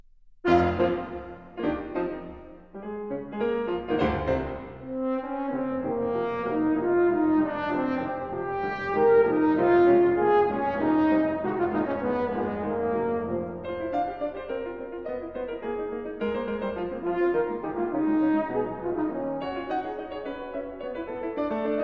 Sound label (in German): Sonate fur Horn und Klavier